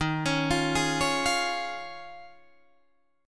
Eb 7th Suspended 2nd Suspended 4th Eb, Guitar Drop D